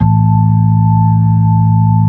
B-3, Keys of the 60s and 70s

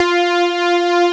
SYN F5; Best Service Gigapack 1

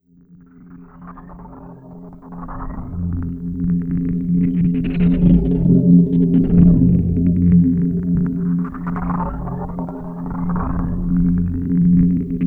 Textured Click; Arps & Clicks; Sonic Foundry (Sony Creative Software); Slow Silhouette

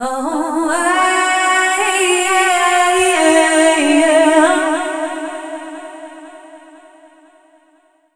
Dance Vocals, FEMALE

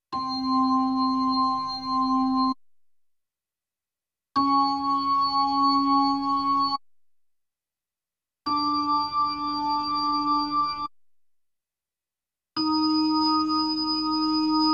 B3 Organ; Masterbits CLIMAX 8

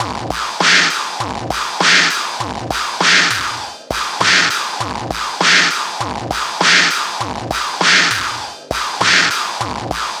TECHNO; INIS; Funky Xtreams

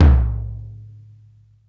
ORC BASS DRUM; Greytsounds VOL-1; ORCH PERC 1

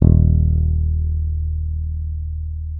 D#1, FINGER MUTE; Xsample VOL-1

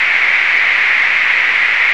noise; AKAI S-Series CD-ROM Sound Library VOL-5

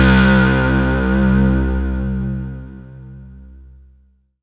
C3, Synth Line; NiceBeats

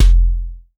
kick, Roland R-70, BD

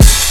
OPEN SNARE; E-MU Formula 4000 Series Vol. 1; Hip Hop Nation

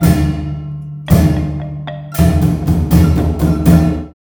MALAY PHR L, Heart of Asia

